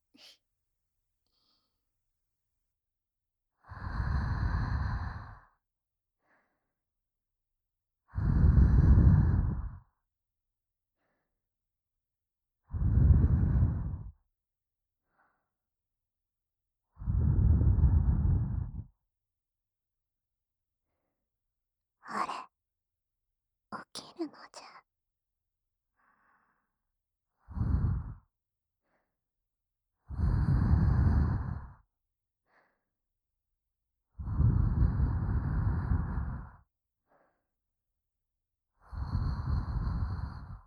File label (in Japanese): れろれろ全身舐め, お耳ふ～ふ～・耳キス・キス・ディープキス・全身舐め, SE無し